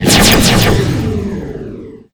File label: Ghost Portal